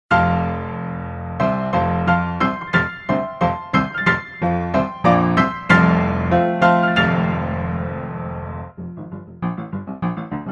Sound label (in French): etude insurmontable des octaves pour la main gauche